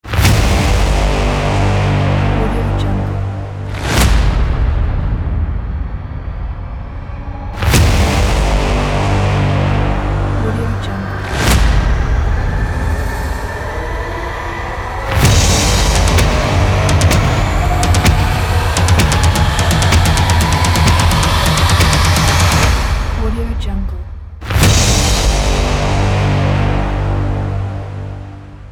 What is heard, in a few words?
hybrid action trailer